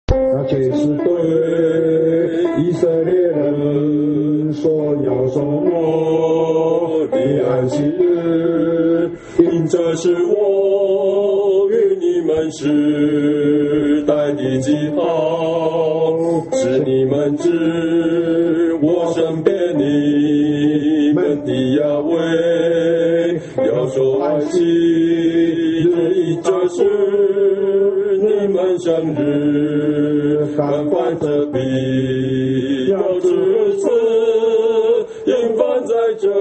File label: hymn; worship